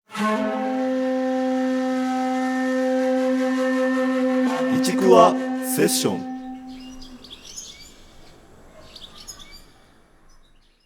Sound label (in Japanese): ジングル